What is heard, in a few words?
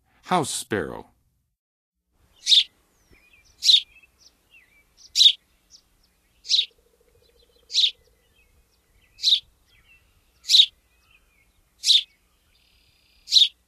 House Sparrow, Songs of Birds of North America; Western USA; Western USA Songbirds